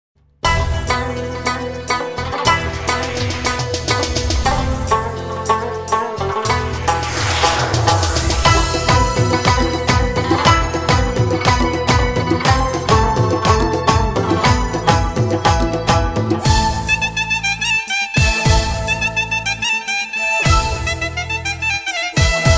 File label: Rabab